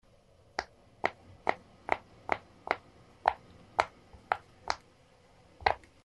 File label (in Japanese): プッシュポップ 音